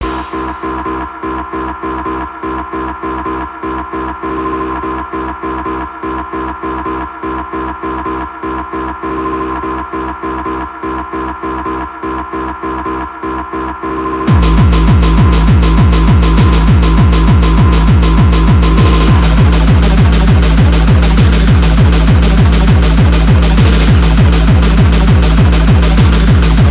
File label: Hardcore Anthem Straight Kick Rave; Hamburg